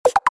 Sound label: Keytone send; LG SV850 Wine 4